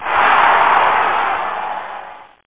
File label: win; conquest